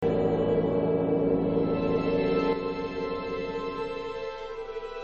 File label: Game Tune